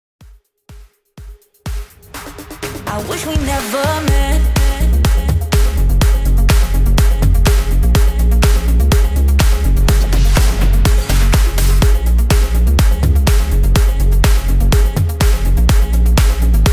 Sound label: PROGRESSIVE CUT 124 BPM